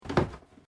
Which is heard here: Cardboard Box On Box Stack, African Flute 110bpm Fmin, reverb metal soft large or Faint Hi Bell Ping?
Cardboard Box On Box Stack